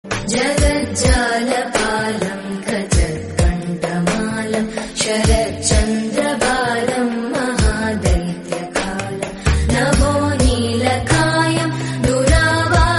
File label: instrumental song